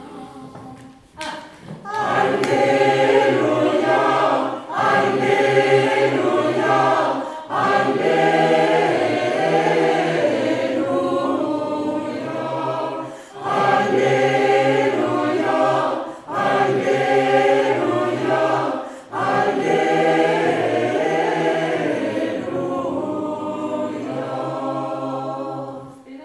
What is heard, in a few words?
Alleluia Tutti; Buxtehude